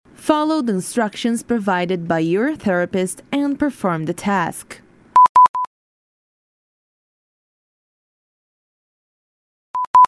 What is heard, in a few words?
INTENSITY PATTERN STIMULI